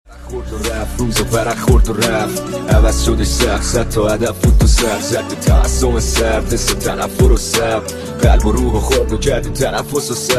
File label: Remix